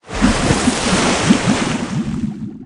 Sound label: Boss Bloonarius Submerge